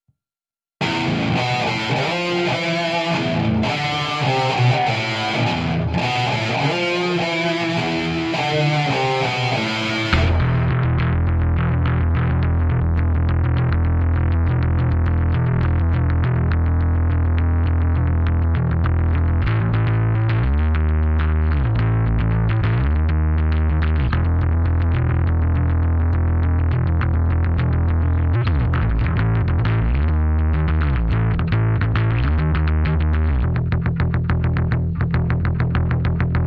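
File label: Bass & Lead Guitar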